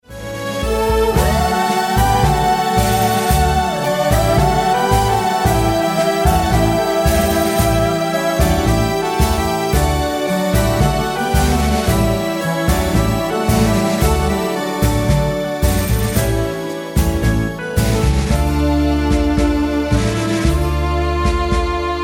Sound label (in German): mit Chor